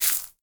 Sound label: step leaf; DeadFright